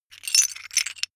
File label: metal pieces clatter short